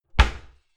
cupboard close dishes ringing hard

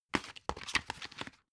dvd case put in booklet

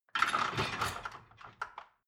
dishwasher cutlery basket replace

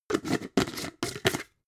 jar glass plastic close fast

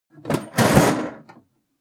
toolbox handling rough

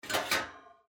kitchen noise

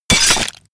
sword impact gore

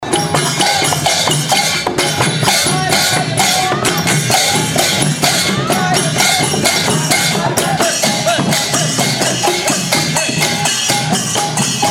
thai percussion group

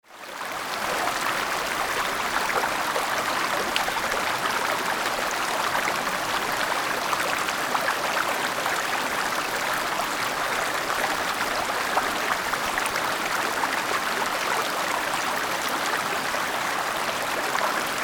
river medium size atmo

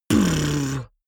beatbox bass drum 808 damped

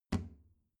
plastic trash can close soft

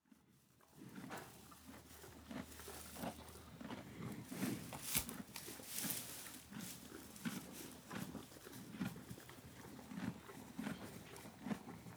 horse feeding

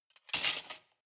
cutlery large multiple drawer place

